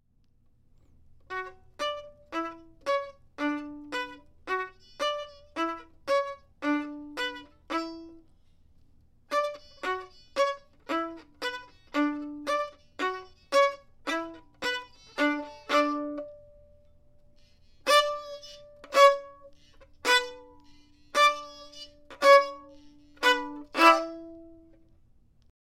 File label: Argument Viola